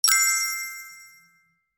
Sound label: Fairy magic touch